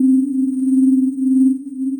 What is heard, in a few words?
Daemonic Pad, synth pad; Ableton; Glitch and Wash